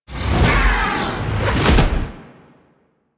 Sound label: Grab Fail Escape Whoosh; Warframe; Teralyst; Ropalolyst; Abilities; Melee Grab